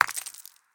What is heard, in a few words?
gambler mouse click; Great Book Of Magic Deluxe; Wazdan